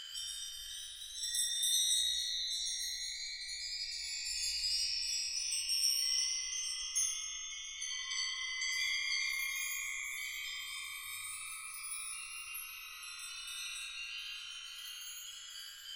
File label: gold slime sparkle; slime rancher